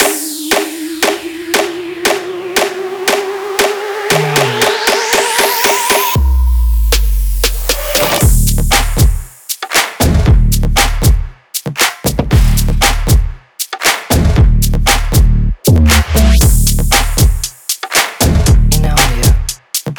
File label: big beat short version